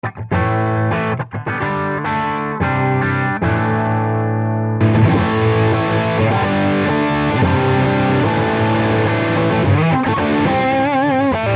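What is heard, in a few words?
Tube Booster Mixdown, guitar; You can alter the output and the tone with their respective controls and there's a color-switch for even more tonal options.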